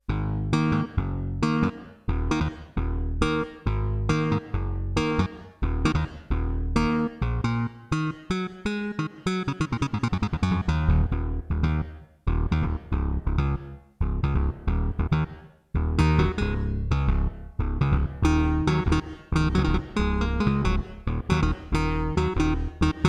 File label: guitar Bass